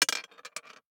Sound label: DICE on Glass, Throw and Roll, Standard, 1 One Die; Samples; ArticulatedSounds; DICE Sfx Party (Extended)